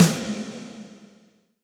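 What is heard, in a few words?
Snr Big Ballad, snare; Alesis D4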